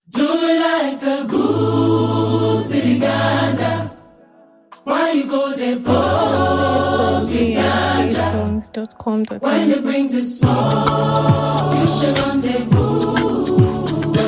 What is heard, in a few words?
loud urban choir